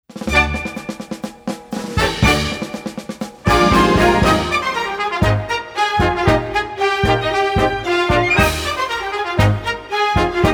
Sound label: Exit Music March; Cinderella